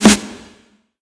Hit; smite; raijin

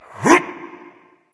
grunt jump; smite; thanatos

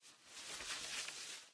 cloth long; smite; scylla

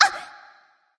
nv surprise; smite; awilix

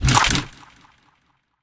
pwr tailwhip; serqet; smite

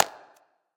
clap; smite; heimdallr

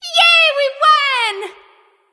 "yay"; smite; nuwa

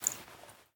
armor; smite; gilgamesh

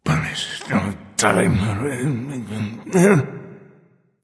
grumbling; chronos

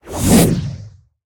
coffin pull; Smite; Baron Samedi

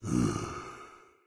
idle breath out; smite; god odin

